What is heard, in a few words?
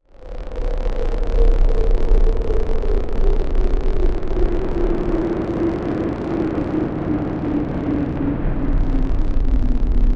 Digital Ambience; Cinematic